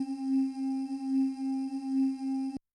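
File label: Choir, Yamaha DTXpress-II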